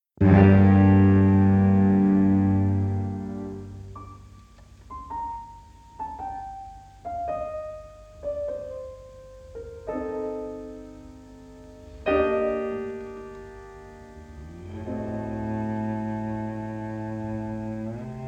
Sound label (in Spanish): Sonata No2 en Sol menor Op.5 No2 I Adagio sostenuto; beethoven